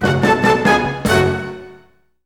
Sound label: DRUM, Synthé